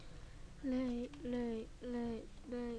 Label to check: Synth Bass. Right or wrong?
Wrong